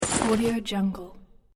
collect reward; 24music

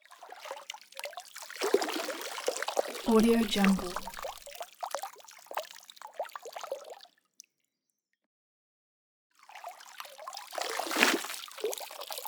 water sparse drips; soundmorph

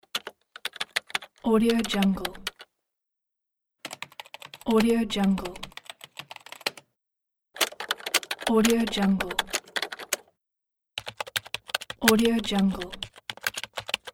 keyboard typing sound effects

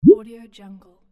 swipe sound

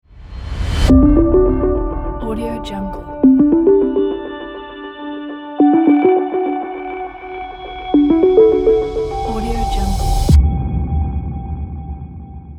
innovation logo

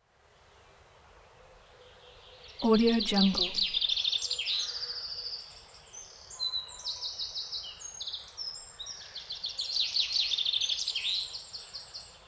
scotland birds perth june 3am calm and clear

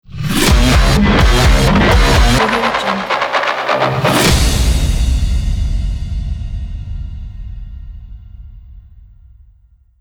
metal trailer logo

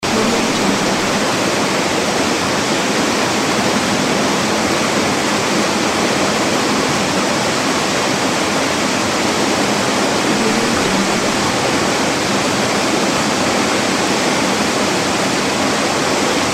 waterfall

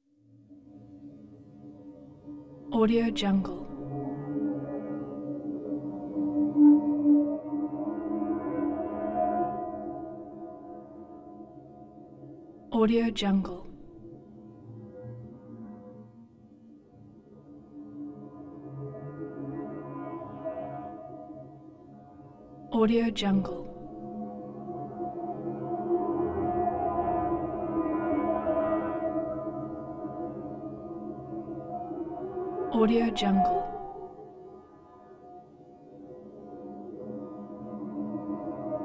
eerie tone metallic swirling tone tubular; prosoundfx

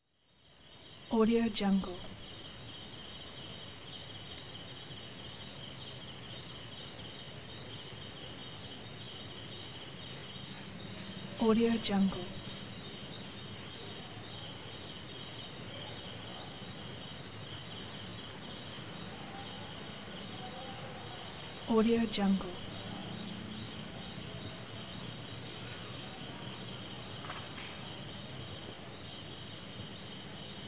night forest

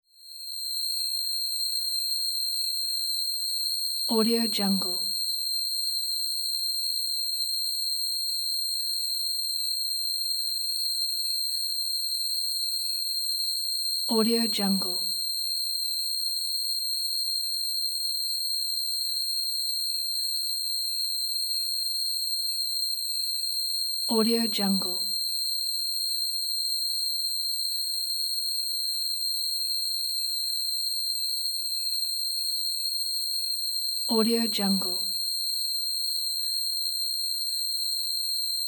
high pitched resonant whistling noise

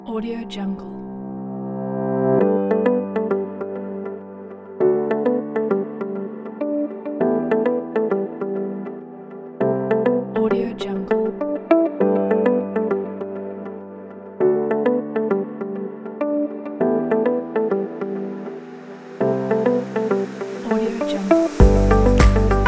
clean and positive corporate

